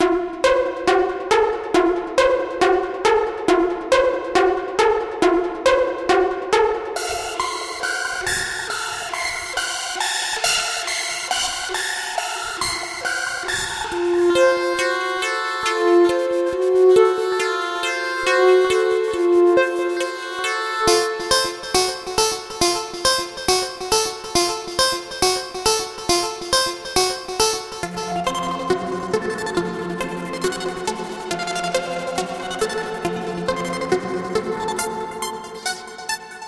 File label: plucked